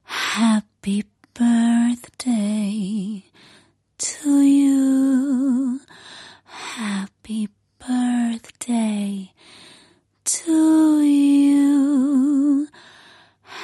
Sexy Solo Female Happy Birthday

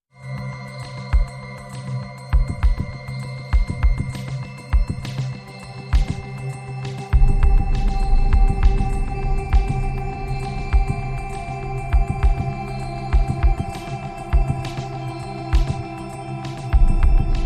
Beat

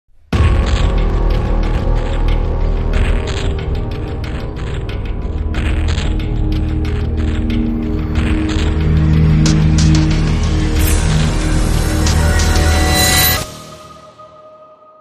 Crime Sting

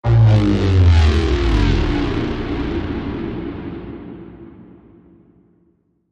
PwDn Overcurrent

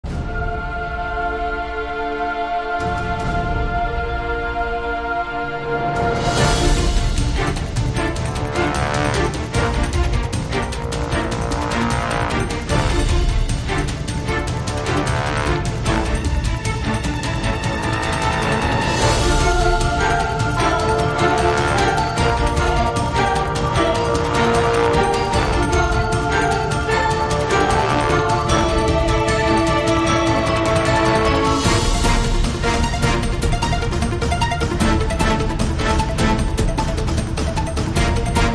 Power Film Trailer

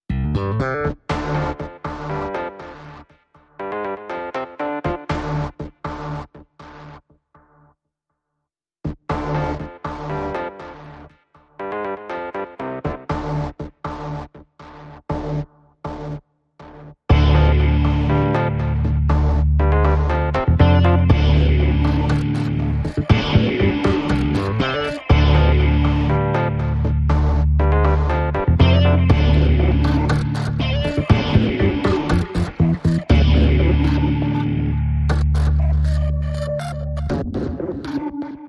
Funky City, No Perc

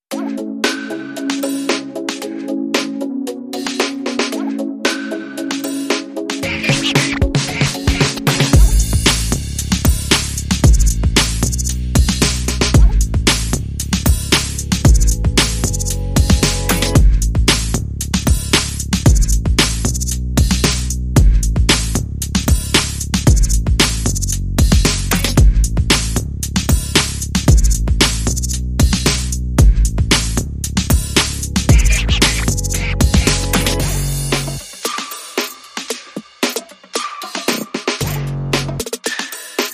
Drum and Bass

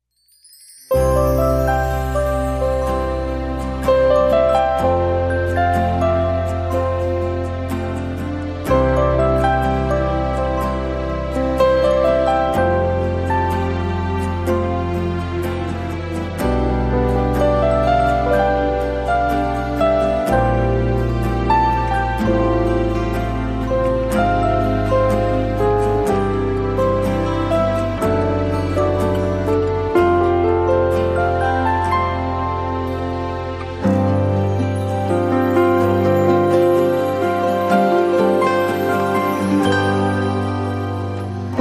Tranquil Breeze Piano Lead

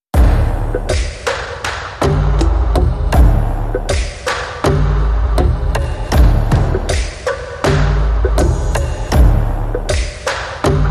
Dynastic Drums

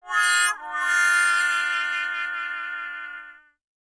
Trumpet Wah Wahh

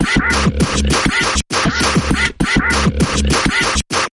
Mechanical Percussion Variation Short Version

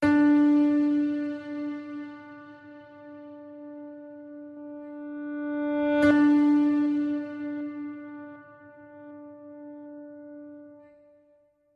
Just A Piano Note D

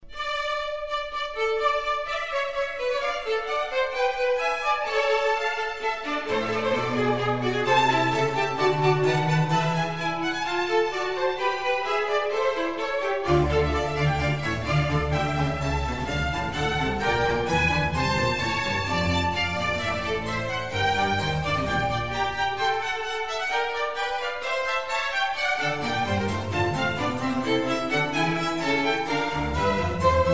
Concerto for Strings in D minor; RV129 Madrigalesco; Antonio Vivaldi

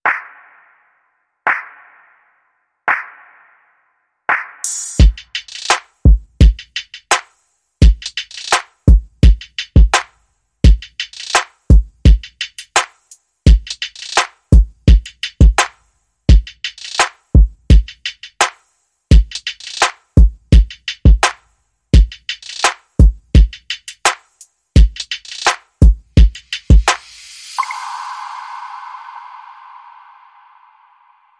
Smooth Beat

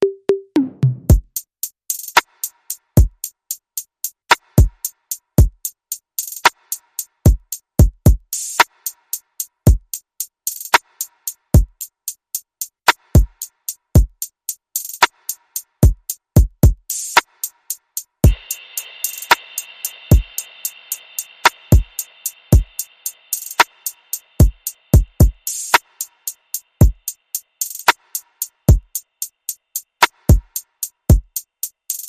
Smooth Manipulator Beat

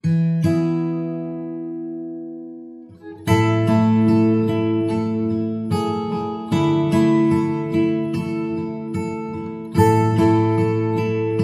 Bright Sunny Afternoon, No Drums

